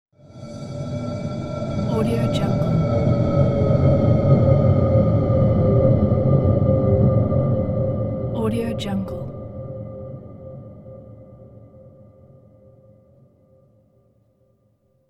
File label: spaceship flying over the head; almira23